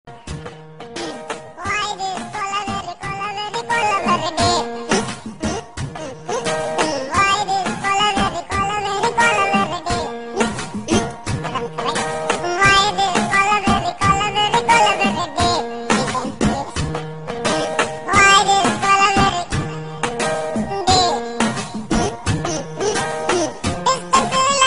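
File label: Chipmunks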